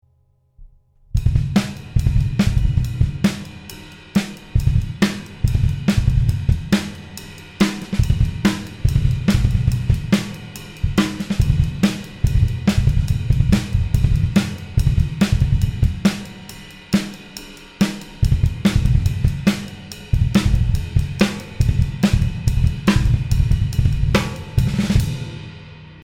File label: drum track; Garcia kit